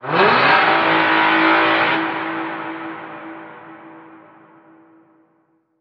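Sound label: Alarm Power Tool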